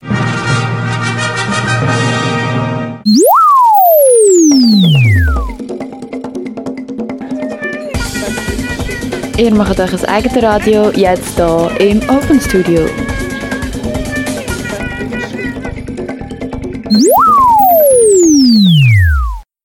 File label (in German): Jingel; Openstudio